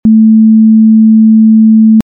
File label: sine